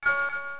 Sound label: Credit Inserted; boogie wings arcade gamerip 1992